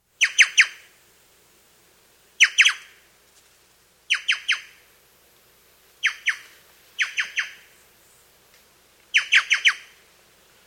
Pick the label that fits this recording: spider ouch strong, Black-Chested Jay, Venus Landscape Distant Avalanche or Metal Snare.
Black-Chested Jay